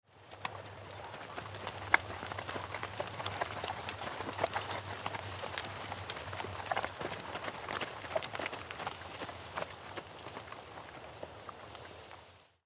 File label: Horse by group slow, Horse Group Trot By, Slow; Small Group Of Horses Trot By On Gravel , Noisy Saddles; Animals